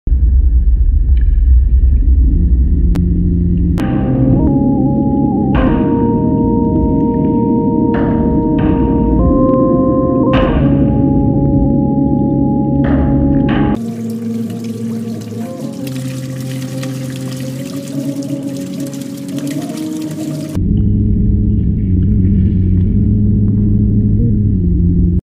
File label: Hydraulophone